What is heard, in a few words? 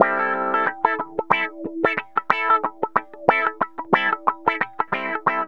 GTR, Funky; Zero-G; Funky Elements